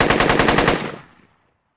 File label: Machine Gun Single Rapid Fire Burst